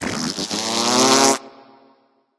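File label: tongue, non-verbal; smite; voicepack; merlin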